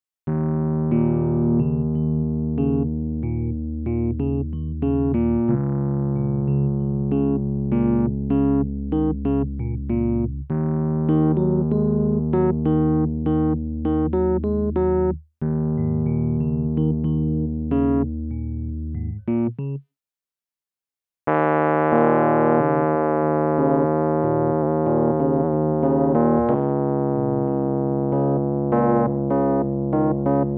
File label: IK Multimedia Mixbox E Piano